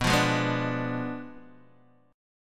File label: B Diminished 7th B down Guitar DADGAD